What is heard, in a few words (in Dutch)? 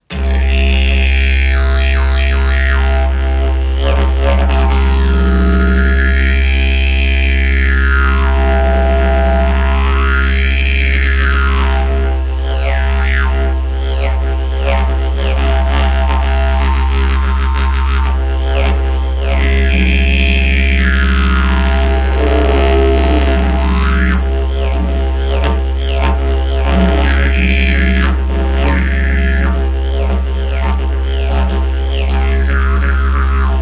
Didgeridoo Geluid